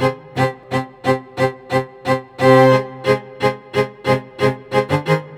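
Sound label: 089 South Hip Hop Rhythm Strings C minor; Pro Tools Essential Loops; Digidesign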